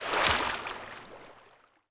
fish Splash; netent

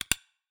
reel Bounce; netent

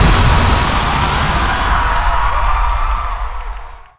Bigwin Start; netent

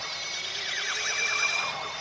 Excitement; netent

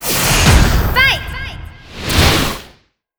boss fight; netent

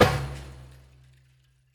spingle Drum End; netent

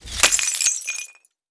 win Explode Small; netent

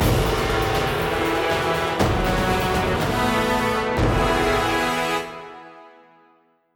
Free Spin Exit; netent

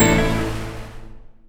Wild Water Scatter

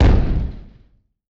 cannon shot; netent

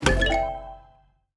explode panther multi

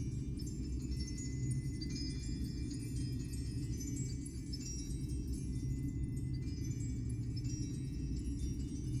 splash Screen Background; netent

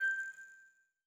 Rose Hover; netent

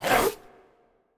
Win Rip; netent

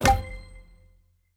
explode lion

